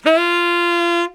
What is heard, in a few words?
SAX LICK, BRASS LICKS; Ueberschall; Jam Box